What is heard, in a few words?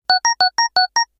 hi score achieved; coffee-crisis-ae